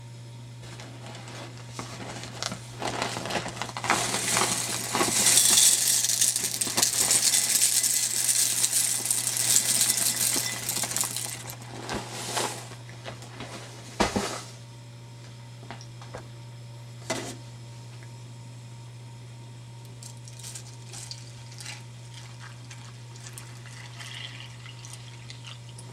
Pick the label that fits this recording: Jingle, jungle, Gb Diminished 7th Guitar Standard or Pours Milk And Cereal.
Pours Milk And Cereal